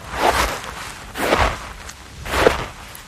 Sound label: snow; a piece of flake